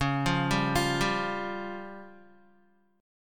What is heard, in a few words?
Db Major Flat 5th Db down Guitar; DbMb5 arpeggiated